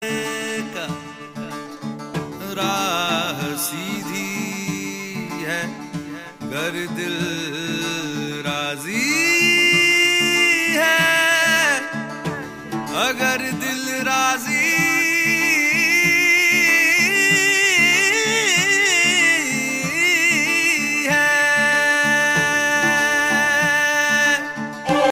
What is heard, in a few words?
Hindi movie ringtone; Raazi; Bollywood